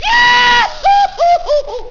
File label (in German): juchizer